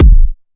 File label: perfect kick drum single shot e key; kick drum samples